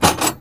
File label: Tool box; INDUSTRIAL